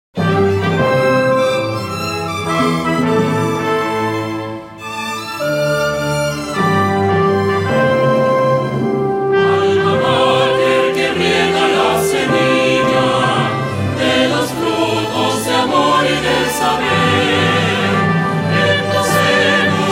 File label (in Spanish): Himno cantado; PUCV